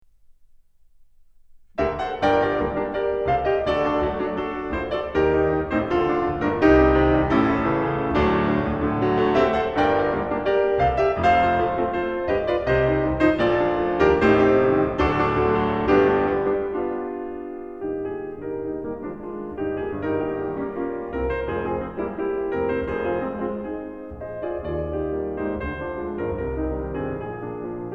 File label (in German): Klavierstücke Op. 118 No. 3 Ballade in G Minor